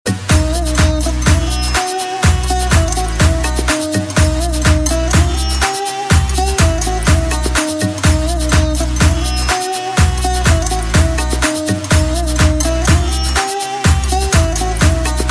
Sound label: groove sitar mix